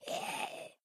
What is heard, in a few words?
zombie idle